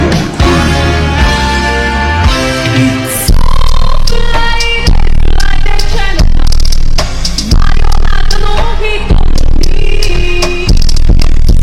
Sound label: phonk bass drop